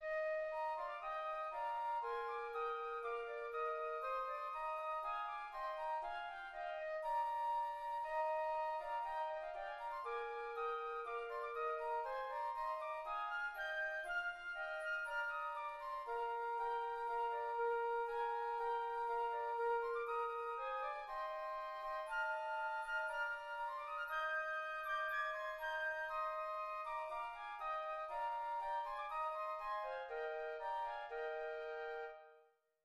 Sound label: Hymn flutes